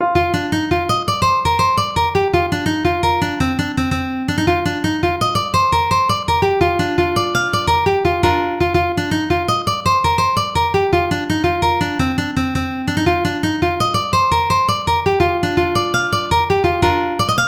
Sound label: ukulele